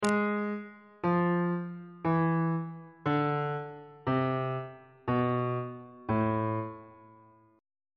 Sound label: b double flat harmonic minor scale descending on bass clef